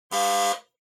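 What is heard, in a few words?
Doorbell Mechanical Buzzer Close 50Hz; ドアベル 機械式 ブザー01-02(近い 50Hz)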